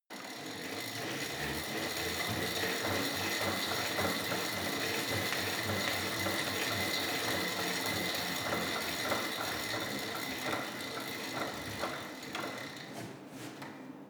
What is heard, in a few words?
indoor bike sequence hard, fitnessstudio; sport freizeit